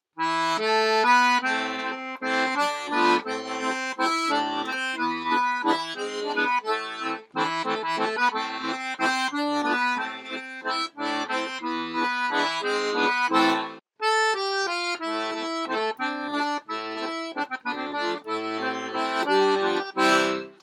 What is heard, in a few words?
ROYAL STANDARD MONTANA VALSE